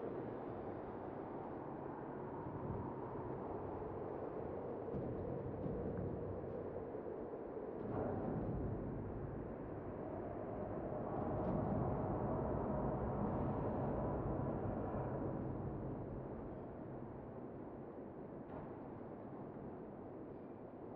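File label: interior small room; Titanfall 2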